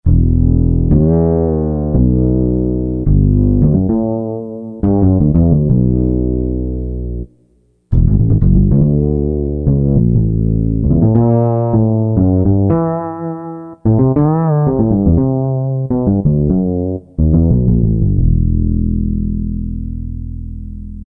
Nu Fretless, Korg N364; Factory Prog demos

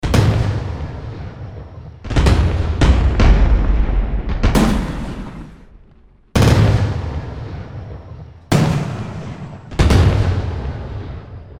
Heavy Hit; Special FX; Roland SR-JV80-15 Special FX Collection

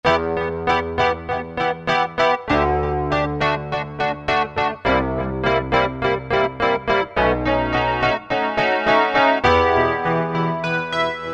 Clasc Wurly, Alesis QS8; Factory Preset examples

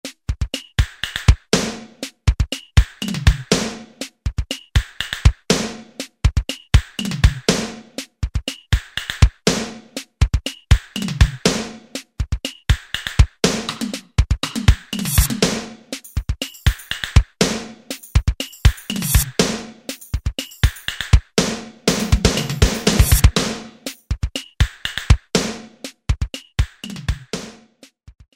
Techno, Alesis SR-16; Preset Patterns

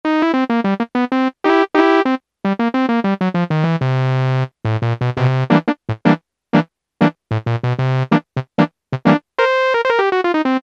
SAXOPHONE, Yamaha SHS-10

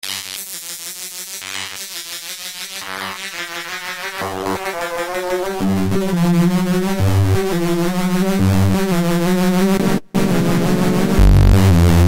D'n'B BREAKS; Korg microKORG; Factory Demo Songs

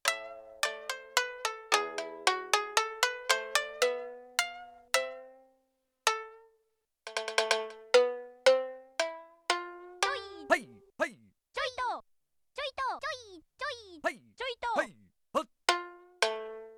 Dark, Shamisen; Yamaha TX16W

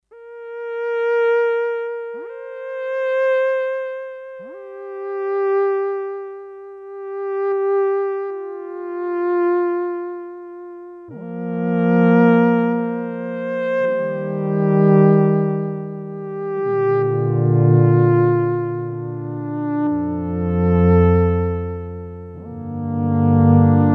Sequential Circuits Six-Trak; 05 Ariel; Factory Programs

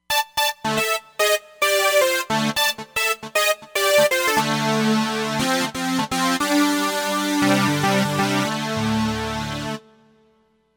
Freeze Synth, Roland Fantom XR

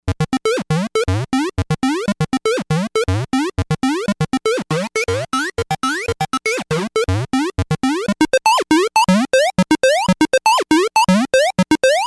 Seq, Novation UltraNova; Factory Patches; Stupid Seq

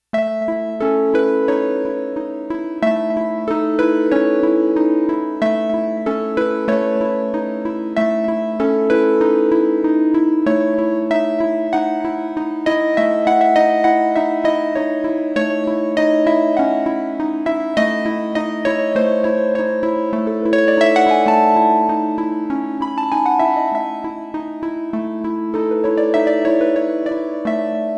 Roland JX-1 Syn Harp A; Factory presets; Additional factory patches

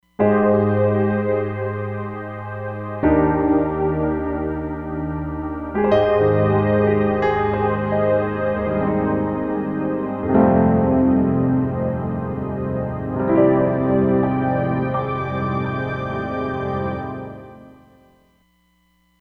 X Piano + Pad, Roland Fantom XR

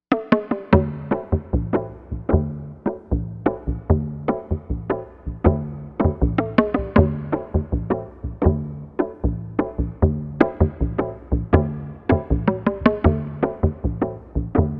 Perc Wave, Korg M1Rex; Factory Patches

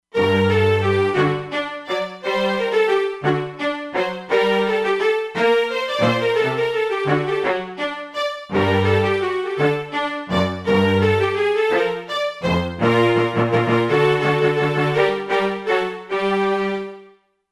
Arco Duet; Factory Presets; E-mu Proteus-2

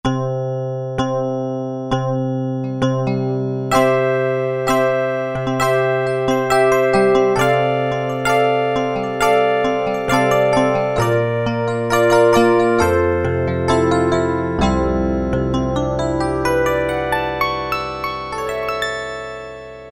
BRIGHT EP, Roland Guitar And Keyboards

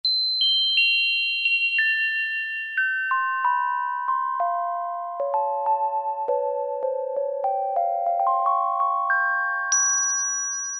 High Bells, Roland Juno-106; Factory Preset

